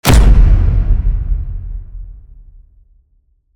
hit brutal puncher cinematic trailer sound effects